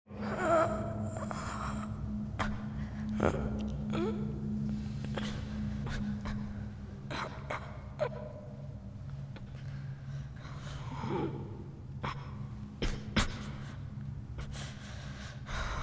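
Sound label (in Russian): zvuk boli jenskii, female pain sound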